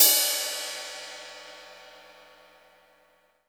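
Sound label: brush hard; Northstar - New Gold VOL-2; 50S BRUSH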